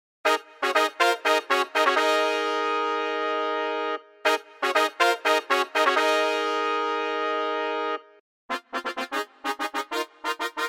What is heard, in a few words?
brass